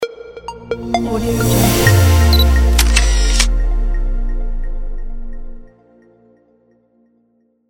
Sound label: photo logo